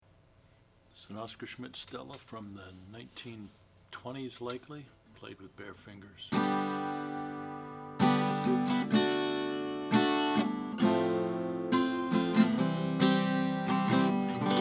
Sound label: 1925 oscar schmidt stella natural